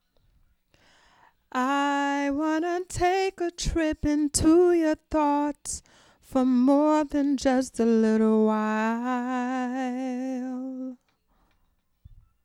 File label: diva